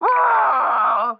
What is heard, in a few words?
Death; Peewee